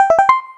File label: open; smbx2; Speedrunner